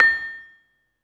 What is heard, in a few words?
LAMELS A5, Asian Traditions; USB Soundscan vol.60; AKAI; LAMELOFO